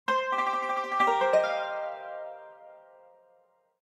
Dialogue Box (Yangqin); B Path of the Teal Lotus

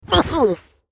Zombie Hit; Character Zombie Hit

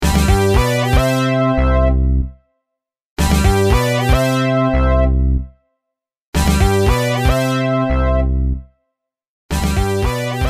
Win; the 2d adventures of rotating octopus character; psp

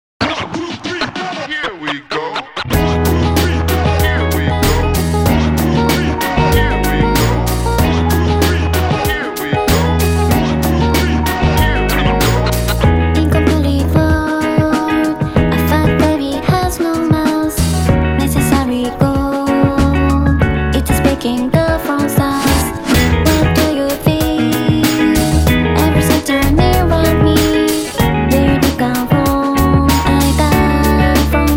Jazzy Hip-Hop Remix; nostalgia music collection op.3; Marigold

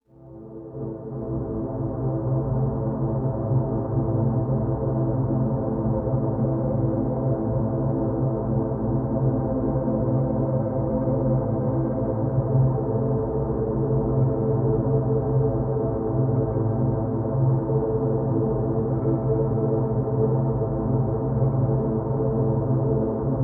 Overlay Drone

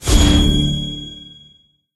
Magic Portal Enter

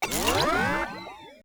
Pod computer exit; LittleBigPlanet 2; PS3

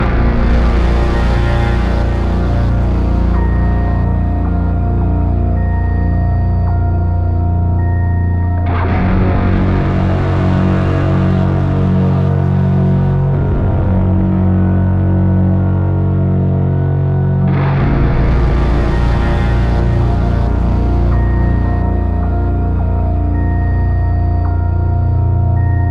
Fear Loop; Face It - A Game to Fight Inner Demons

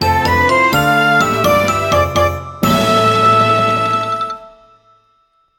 Item Get; RPG Time: The Legend of Wright; Xbox Series X/S

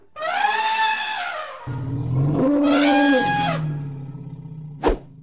Intro Run Swing

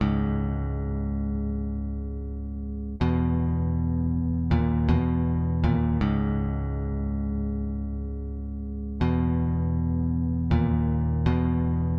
Cassowary Song BGM Bass; Songbird Symphony

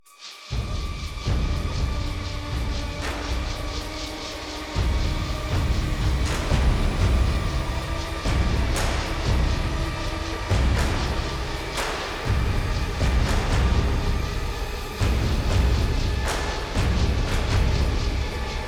Battle Caverns; Eon Altar

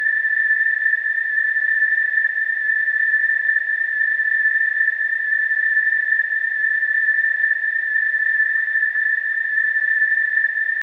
Low Health Loop; Void Bastards; Krell

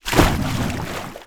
Splash Small

